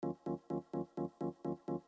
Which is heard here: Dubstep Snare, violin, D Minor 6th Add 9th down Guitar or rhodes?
rhodes